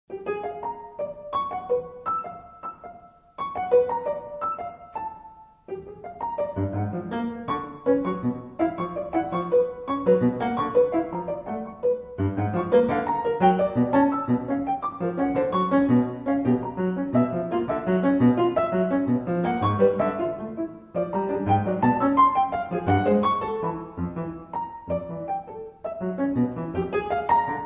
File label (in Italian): Canone; Antologia Provvisoria